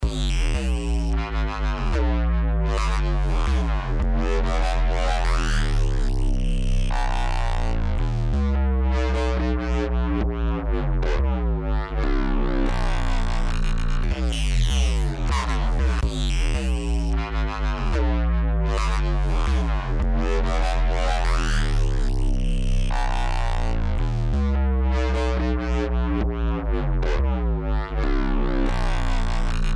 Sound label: music